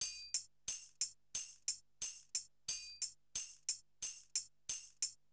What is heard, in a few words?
Gabra Dholki Loops